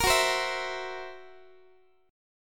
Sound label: Fsharp 7th Flat 5th down Guitar; F#7b5 strummed